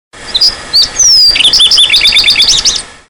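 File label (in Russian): звуки воробьев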